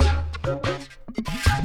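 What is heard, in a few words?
Music Dpad Base 145; from dust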